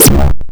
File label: teleport